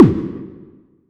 Kick; ModelCycles

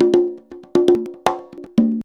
CONGA 119

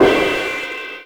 CRASH, Drums Dance & Groove; USB Soundscan vol.11; AKAI

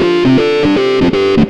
xtra lead

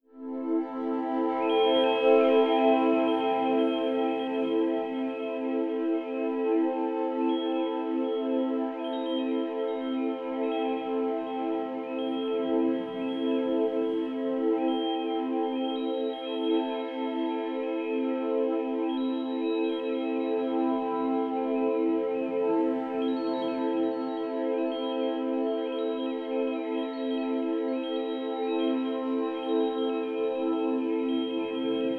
Pad A minor; M8

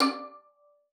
D5, VIOLIN SPICC; Xsample VOL-5

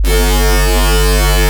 Bass Texture

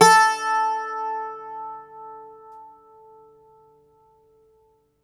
IRISH BAZOUKI, Plucked Strings

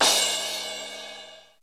RX15 CRASH; Crypton - DrumBase5000; RX15+17+21L

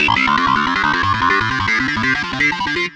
Synth Climb

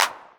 Clap, Novation Drumstation; DrumstationV1

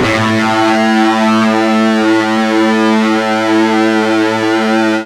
FUZZ A 1, ULTRA FUZZ, Guitar; East West; Steve Stevens Guitar Samples Collection

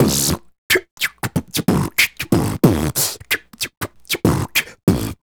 Beat Box, Hip-Hop and RnB Vocals

